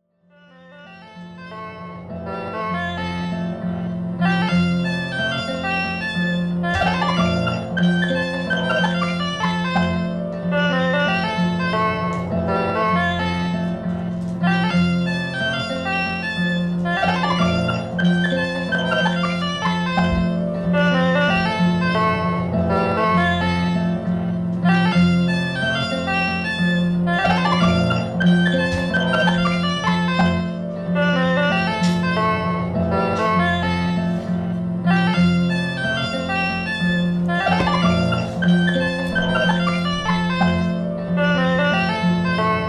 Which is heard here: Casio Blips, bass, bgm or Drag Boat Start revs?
Casio Blips